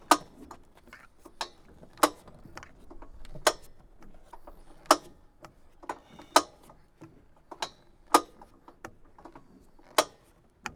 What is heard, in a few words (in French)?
BRASSAGE HELICE